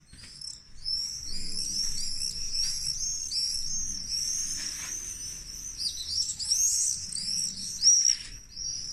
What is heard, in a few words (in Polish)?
jerzyki